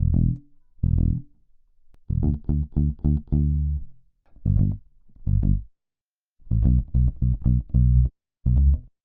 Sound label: bass; accompaniment extraction